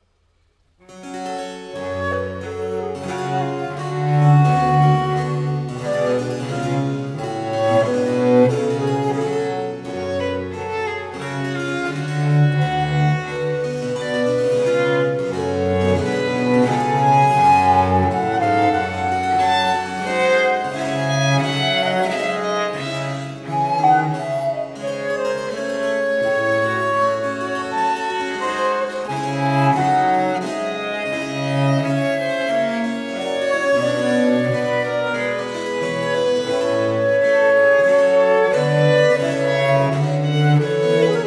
Trio Sonata in G Minor for flute violin and basso continuo Adagio; TWV 42:g13